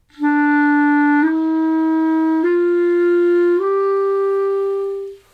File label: clarinet